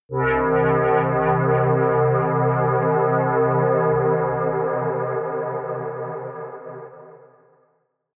atmospheric waves, drones

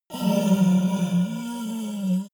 yeti groan pain weak; kreaturen

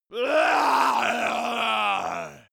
monster male growl aggressive; kreaturen

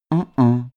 male deny mhmh; soundpool

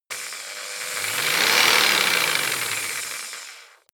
jigsaw engine medium slow short; maschinen; saege

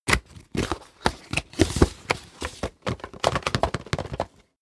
box cardboard small open; objekte

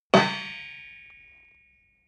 metal impact midsize rich

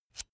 electric drill bit remove; maschinen; bohrer; soundpool

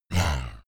beast growl low, comedy cartoon; kreaturen; soundpool

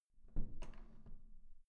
door handle down soft

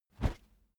whoosh wood cornered soft; swooshes-swishes

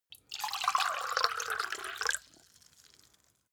glass pour; soundpool

